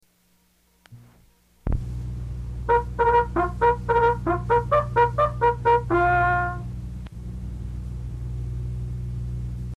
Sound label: Mail Call, bugle calls